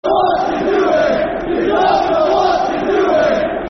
You Don't Know What Yer Doing, Football Chants (Soccer Songs); Cardiff City; fanchants-com